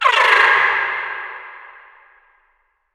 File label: Timid; moul; Bahro